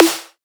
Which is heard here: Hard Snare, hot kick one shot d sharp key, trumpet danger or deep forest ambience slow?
Hard Snare